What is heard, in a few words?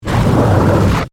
Hit Warble Flange; Hits; Sound Design; BlastWave